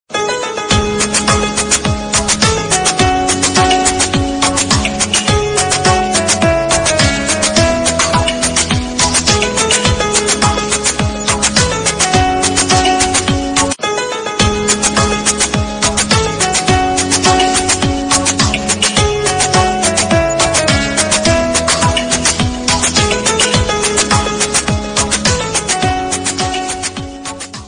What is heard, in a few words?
background music